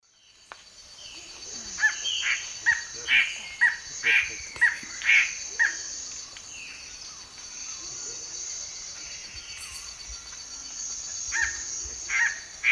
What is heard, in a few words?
Cercomacra cinerascens, song, S. America Eastern Lowlands